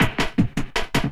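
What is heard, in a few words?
LOOP snare drum, Drum & Bass, 160 BPM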